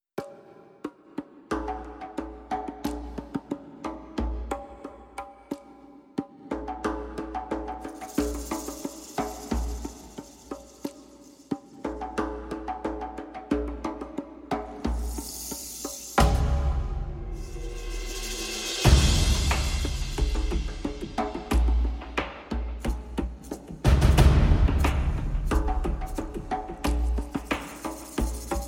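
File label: percussion only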